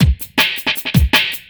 Classic Beat, Drum N Bass

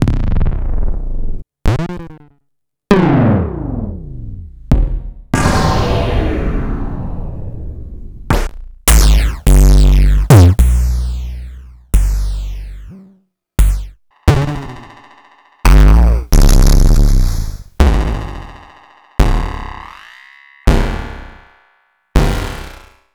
Kick High Quantity

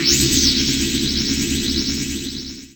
SPACE-FX

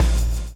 blow; Spectrasonics - Backbeat

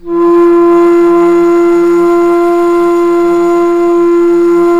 BASS F2, BASSFLUTE; USB Soundscan vol.23

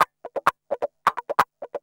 GUI, LICKS; 130 LICKS

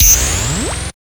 XTRA PERC

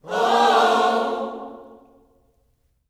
WHOA-OHS, Vocal Gospel

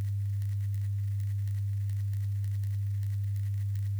Misc Noise

